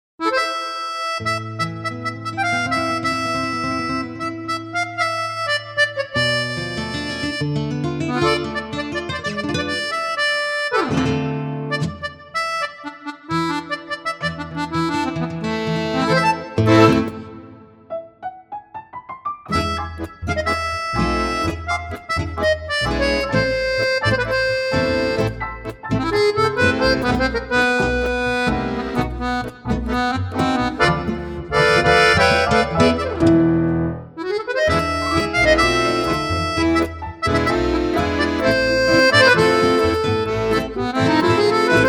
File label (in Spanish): una danza